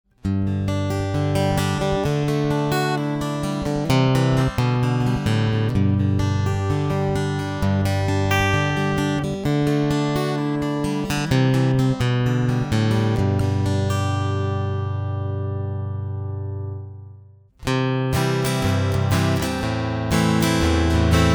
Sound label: Nemesis EA unbehandelt Git ins Interface